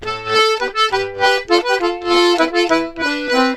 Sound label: Complete Accordions; USB Soundscan vol.40; AKAI